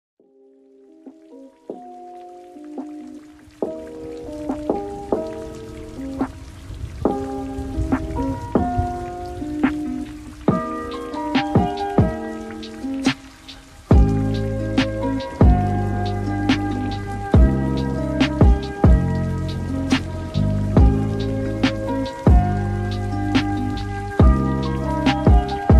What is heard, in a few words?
rainy lo-fi chill mix